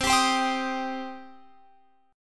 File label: C Minor C down Guitar Open F; Cm strummed